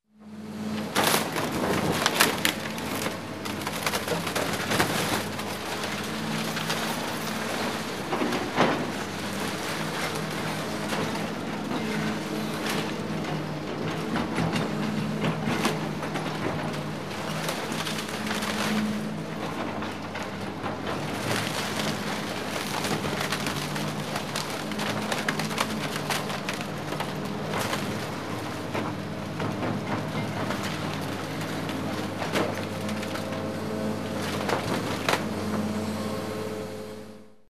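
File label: bulldozer driving through forest, trees cracking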